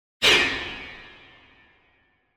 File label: Piano Jarring Piano Hit, High Tones - Single; Hollywood Edge; sound designer's toolkit v2